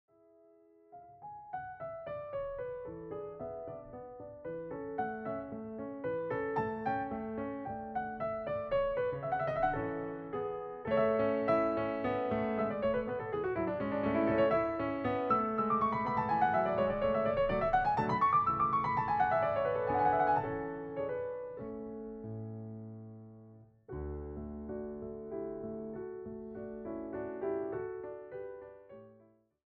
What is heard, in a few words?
Piano Sonata No. 11 in A Major, Menuetto; K. 331 Alla Turca; mozart